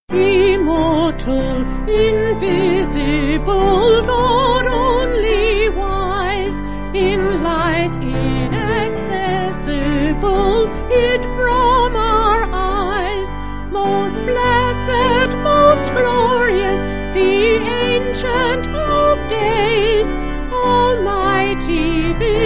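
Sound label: Vocal, Pipe Organ; Immortal Invisible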